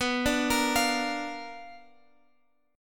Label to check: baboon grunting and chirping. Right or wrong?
Wrong